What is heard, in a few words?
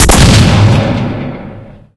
shotgun double; hl2